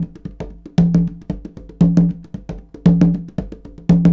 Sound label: 116 African Conga Grv; World; African Percussion